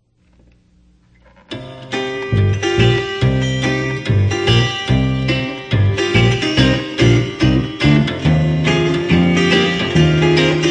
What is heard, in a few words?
Jazzy AHarp with Bass added